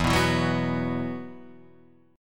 E Suspended 2nd

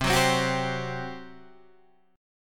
G Major 7th Suspended 4th, C

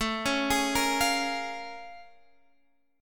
Bb Minor 7th A; Bbm7/A arpeggiated

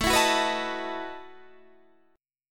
A 6th Suspended 2nd Sharp 11th, guitar

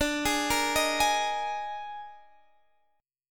D sharp Major 7th Suspended 4th, Guitar

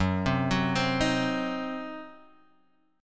Eb Minor 6th Gb, guitar chord

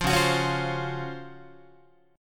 Db Major 11th down Guitar; strummed; JGuitar

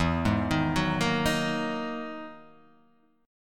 E Major Sharp 11th

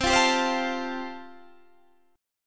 G 7th Suspended 2nd Suspended 4th C chord

